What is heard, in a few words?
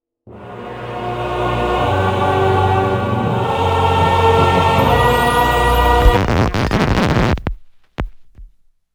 jingle success needle scratch; jack keane 2 the fire within windows 2012